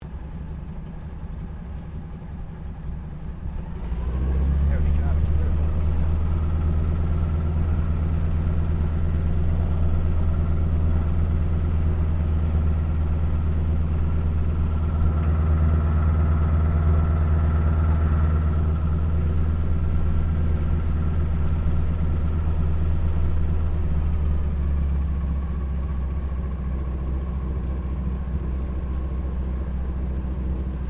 AIRCRAFT AT-6D HARVARD MK III TAXI SHUTDOWN INTERIOR; Harvard Training Aricraft; Airplanes